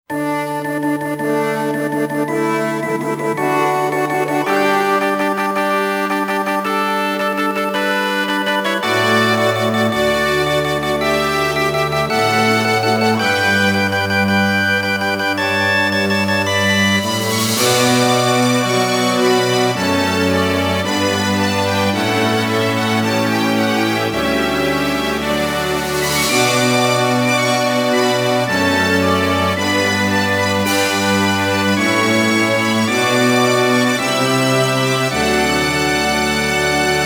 Wedding March; Rune Factory

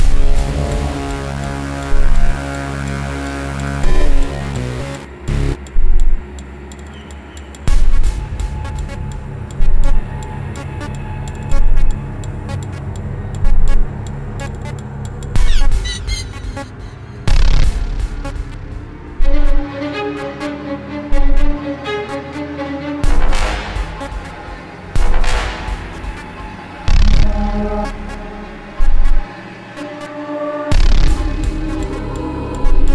Space Terror; stardrop

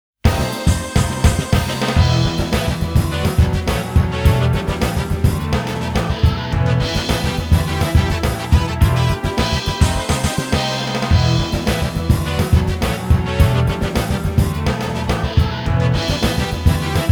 Own Goal Replay BGM

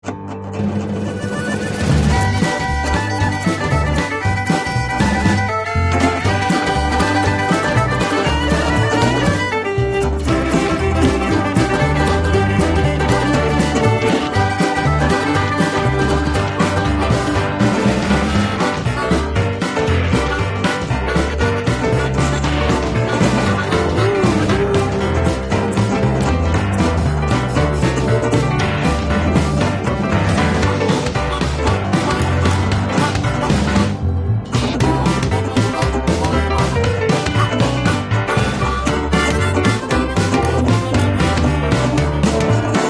Loop Garage; Tales from the Borderlands

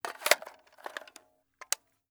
rifle reload

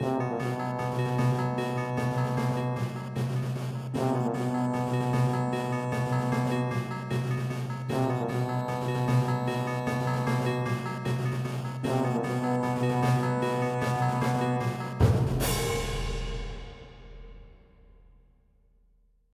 Star Chords; Rocksmith 2014 Edition Remastered; macOS PS3 PS4 Windows Xbox 360 Xbox One

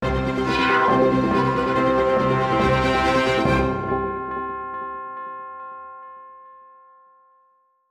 Player Victory End

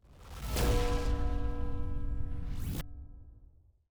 JINGLE Mission Accomplished; Fallen Knight

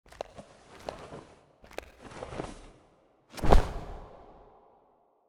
Well; Yesterday Origins; Switch, PS4, PC, iOS, macOS

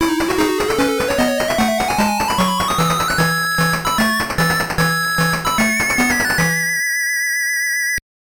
Jingle; World Series Baseball 95; Game Gear

